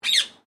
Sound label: Bird Parrot Indian Ringneck Chirp